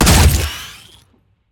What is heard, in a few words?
weapon gun gunshot futuristic; Sonniss GDC